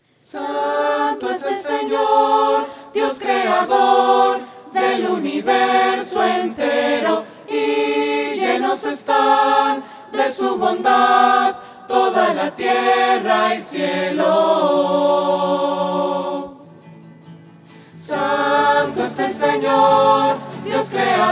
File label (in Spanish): santo fresa voces; misa